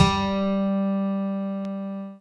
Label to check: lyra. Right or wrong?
Right